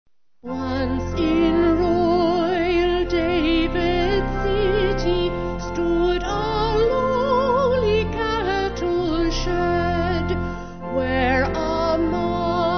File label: Vocal Organ; Once In Royal Davids